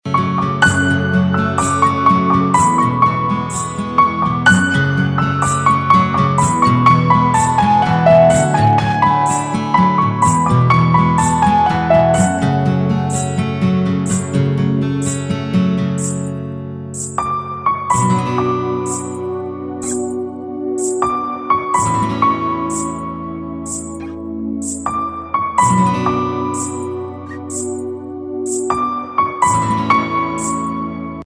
Instrumental Ringtone; Bollywood Hits (2014)

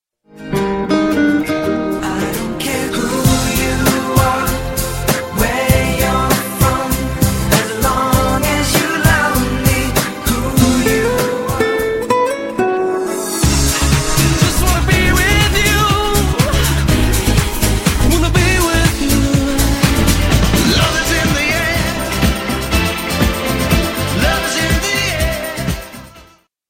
Romantic Remix; Valentine Tones

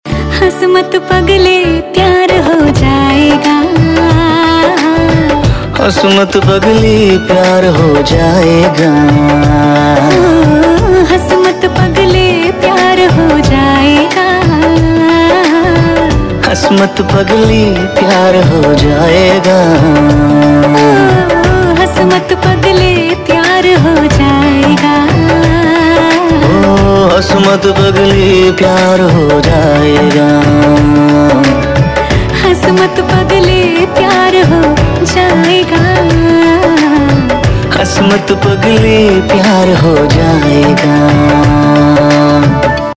Duet Ringtone, Bollywood; Toilet - Ek Prem Katha

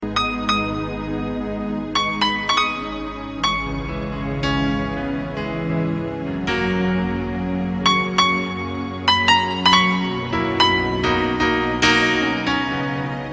Heart Broken Instrumetal

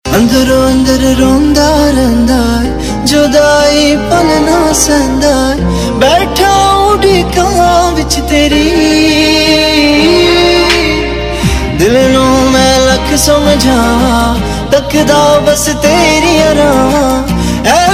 Unplugged Version Ringtone, Punjabi

